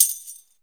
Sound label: TAMBOUR K, Ragga & Dub Reggae